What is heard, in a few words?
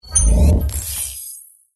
power on